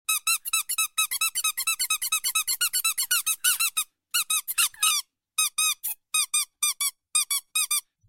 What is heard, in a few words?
squeaky toy